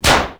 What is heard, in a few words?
Snap - Very Short